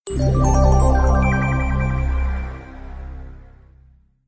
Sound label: win